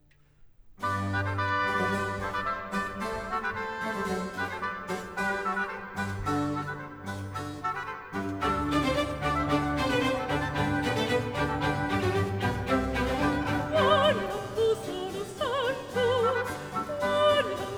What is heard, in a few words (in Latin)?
Gloria, Allegro; Rv588; Quoniam Tu Solus Sanctus; Antonio Vivaldi (1678 - 1741); Vivaldi - Gloria (2009)